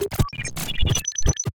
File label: data stream confirm